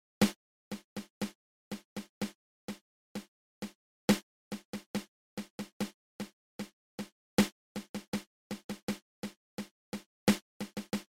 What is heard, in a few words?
Drag Paradiddle 2 open close open